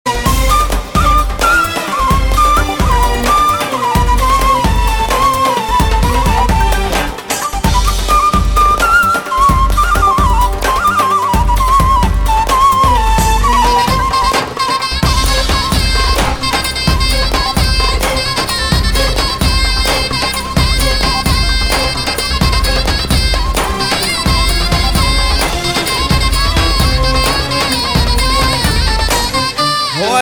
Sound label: Music